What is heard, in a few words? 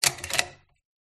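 Slide Projector Click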